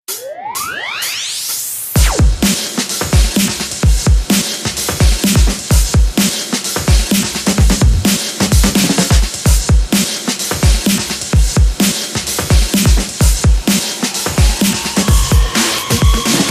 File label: Xtreme Beat